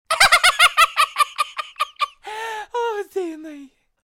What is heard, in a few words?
laugh; keith lemon